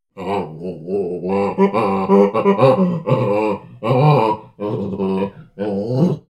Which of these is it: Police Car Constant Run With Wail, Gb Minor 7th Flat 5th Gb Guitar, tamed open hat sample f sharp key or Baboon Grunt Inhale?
Baboon Grunt Inhale